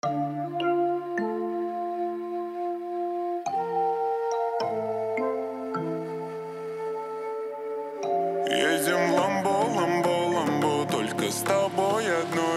37-39-41 Hz Low bass

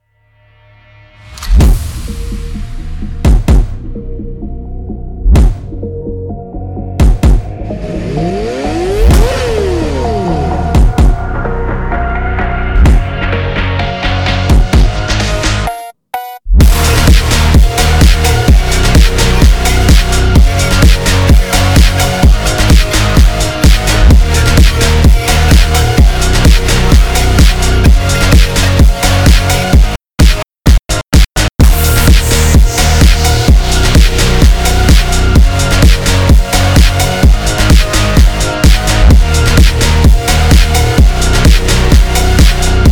Instrumental

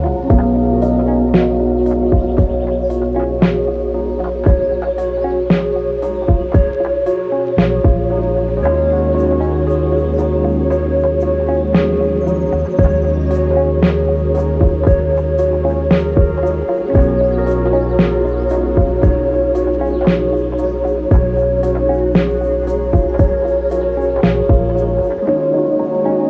Study Beats

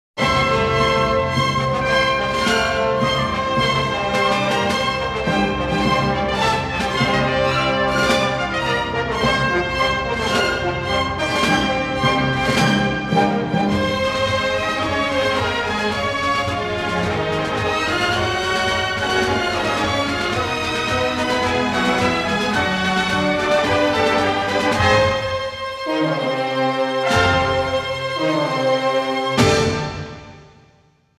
Philharmonic Orchestra Choir

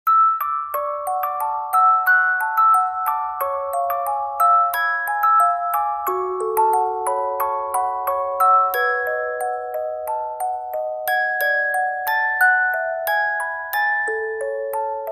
Music Box; Oran host club; anime

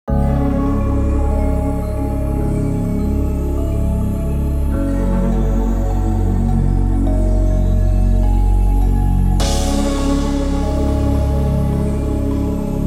Chillout Lounge

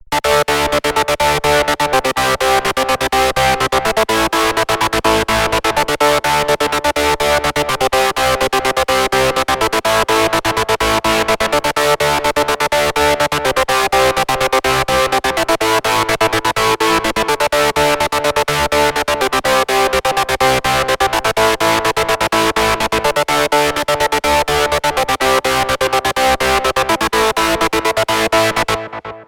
Techno Dance

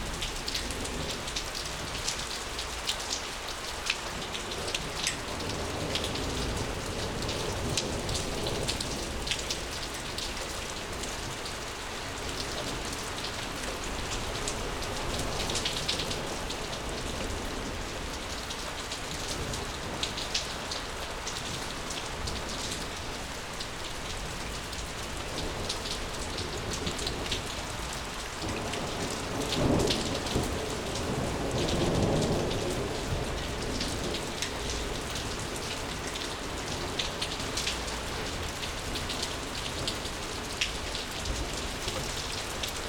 Rainstorm Sounds

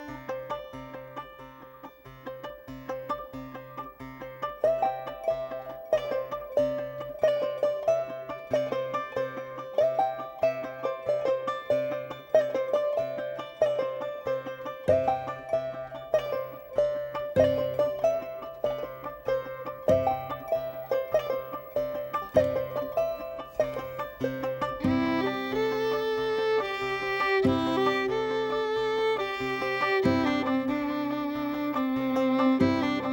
A Kazakh Melody

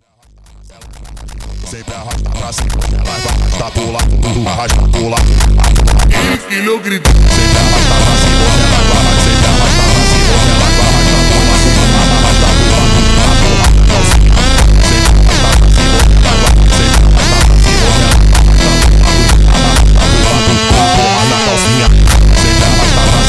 Arabic Slide Funk Slowed

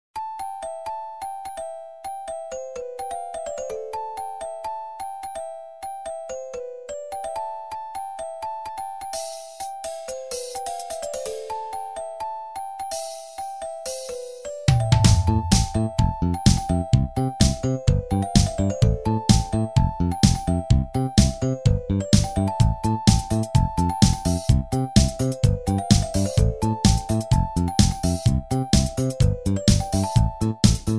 Casio CTK 7000; Casio Music; CHastushki

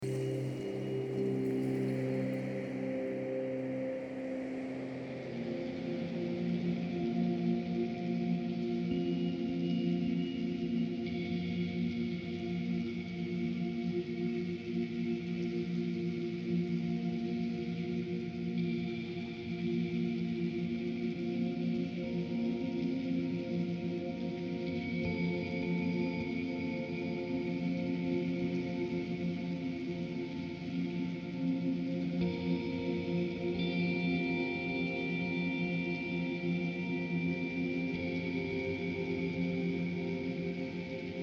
Zen Meditation and Natural White Noise

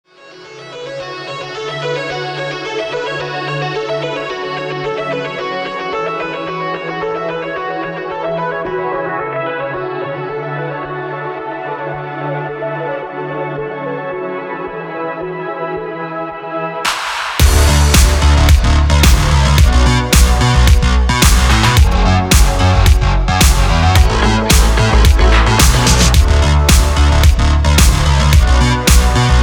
Instrumental Ringtone

